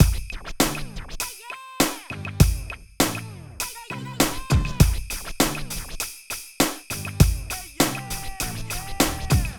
Beat

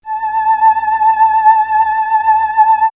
Swirls Opera, pads; X-Static Goldmine

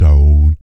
PERC, Vocal, Jazz FX, BASS PERC

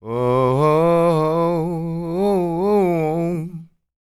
SING, Blues Soul

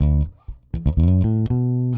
Pop, Bass; Sonic Foundry (Sony Creative Software); Bass X

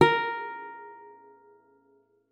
A#4, guitar; Xsample VOL-2

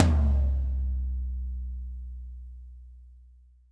tom, verby kit; Spectrasonics; Burning Grooves CD2

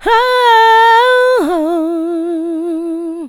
OHOOHOHAN, Funky Vocals; USB Soundscan vol.18

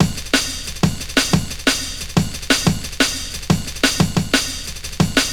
Dope Break, Drum & Bass; Fluid Dynamics

